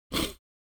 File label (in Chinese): 擤鼻涕